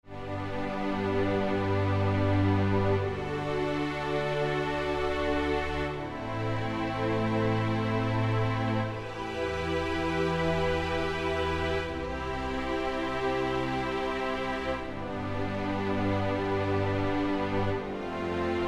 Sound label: String Chords in Root Position